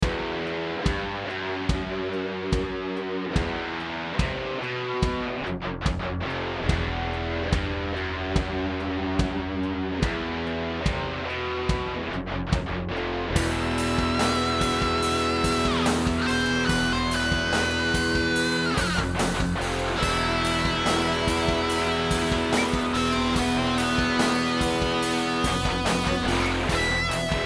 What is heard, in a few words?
thrash, guitar